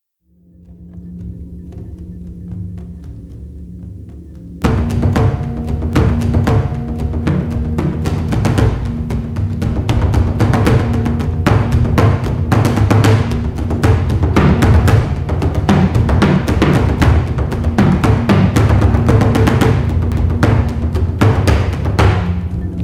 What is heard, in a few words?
TAIKO, Ethnic